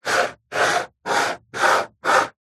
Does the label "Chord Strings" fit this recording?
No